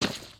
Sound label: step gravel